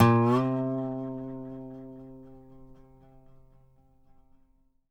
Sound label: dobro, C3; DOBRO UP